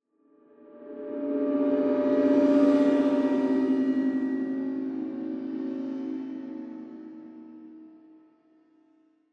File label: Horror Cymbal Swells Eerie; Scary Tones; Sound Effects:Horror